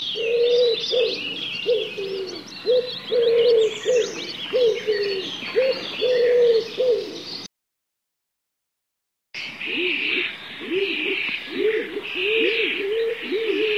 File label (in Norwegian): Ringdue og deretter skogdue